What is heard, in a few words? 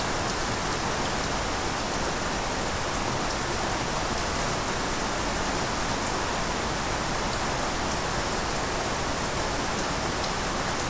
Baby White Noise